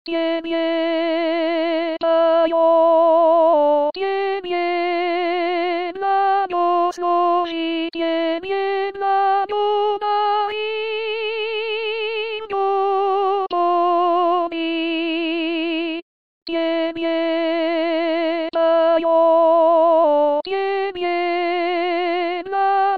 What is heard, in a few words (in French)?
tebe poem soprane